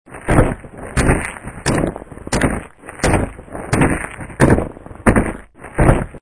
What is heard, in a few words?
Footsteps (loud); horror; horrorgifs